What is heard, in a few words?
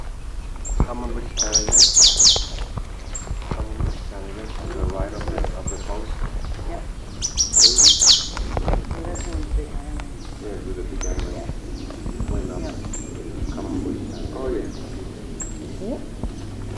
ochre breasted brush finch